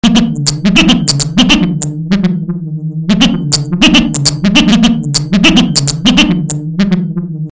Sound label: dark synth loop